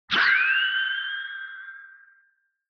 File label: Retro Anime Accent High